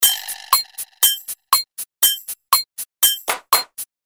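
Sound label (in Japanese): クイズ出題・シンキングタイム風な効果音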